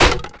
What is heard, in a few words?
fence post hit; bouncyobjects; Tony Hawk's Project 8; XBOX